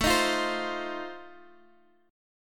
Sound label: F 7th A